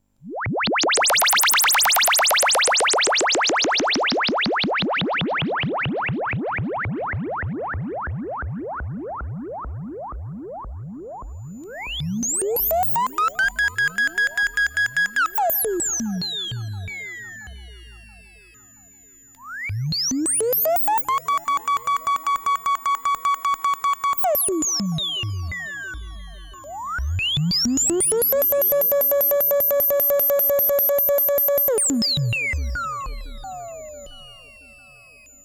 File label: vc LFO; SonicSix